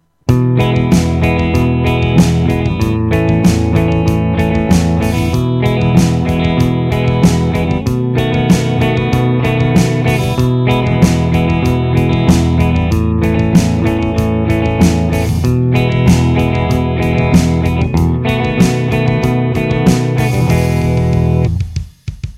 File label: country